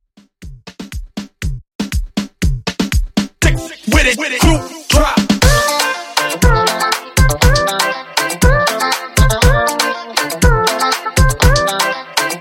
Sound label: Reggaeton 120Bpm